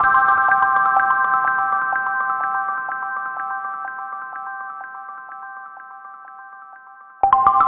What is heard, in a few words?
Noisehammer